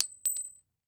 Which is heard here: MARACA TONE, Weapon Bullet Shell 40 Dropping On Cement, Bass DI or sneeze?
Weapon Bullet Shell 40 Dropping On Cement